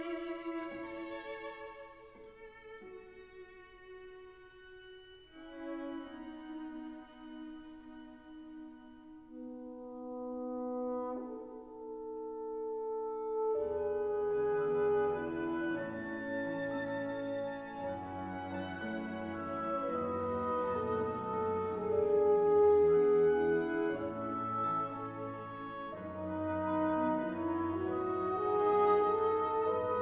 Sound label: classical